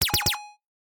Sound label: Hit